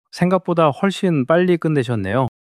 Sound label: surprise